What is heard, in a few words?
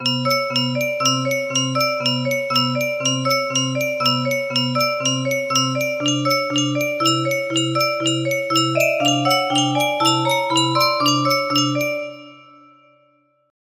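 music box melody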